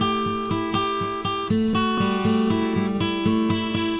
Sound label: acoustic guitar picking